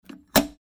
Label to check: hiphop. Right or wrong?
Wrong